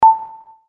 beep

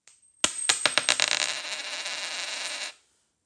Flip

